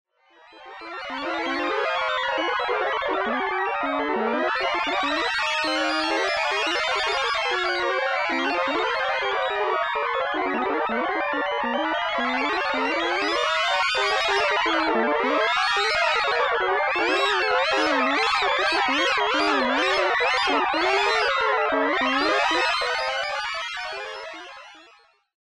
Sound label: Chaox